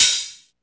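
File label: REMIX OH, open hat; Drums In Your FACE!; BONUS HATS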